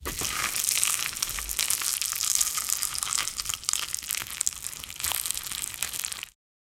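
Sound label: sizzling burgers on the grill; appetizing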